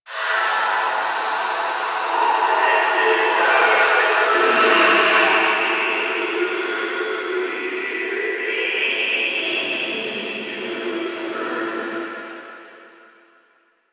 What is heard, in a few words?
Infested Distant Sewer Grate Screams; Ambience; Warframe; NineteenNinetyNine